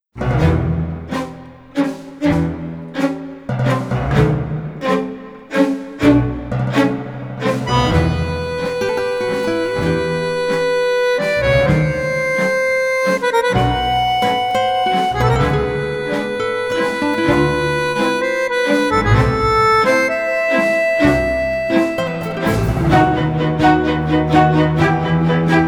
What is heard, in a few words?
TG Argentinos, Tango Orchestra, Tanzmusik; Prandi Sound